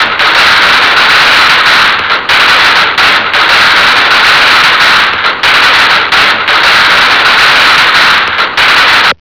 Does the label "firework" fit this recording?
Yes